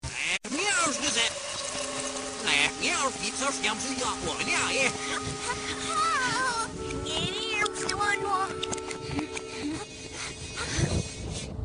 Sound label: frying pan, reverse